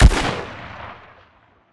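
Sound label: close attack tail; Titanfall 2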